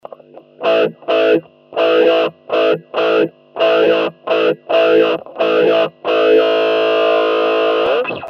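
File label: V-tron, Line 6 M13 guitar processor; Voice Box; Voice Box+Mu-Tron; говрит соответсвенно атаки по струнам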